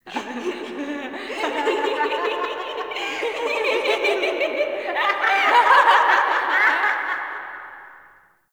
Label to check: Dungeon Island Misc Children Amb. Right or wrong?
Right